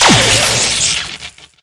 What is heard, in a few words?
lightning shock